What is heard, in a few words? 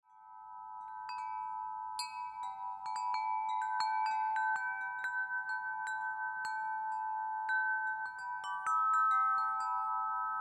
majestic chime